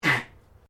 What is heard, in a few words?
Animal Dog Chihuahua Cough; Various domestic dogs; Animals